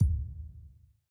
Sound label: emotes full; emote panel